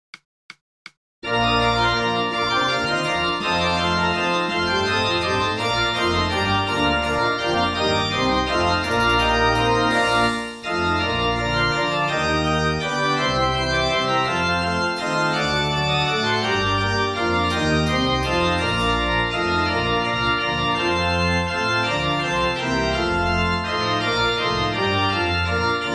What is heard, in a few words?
we wish you a merry christmas midi